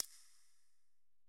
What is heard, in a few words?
button Down; netent; jewelfruits